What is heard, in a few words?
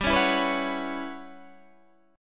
E Minor 6th Add 9th G chord